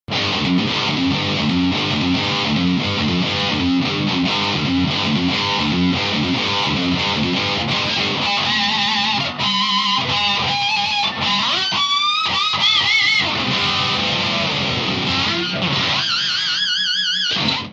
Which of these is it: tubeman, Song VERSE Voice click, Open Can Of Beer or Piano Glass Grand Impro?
tubeman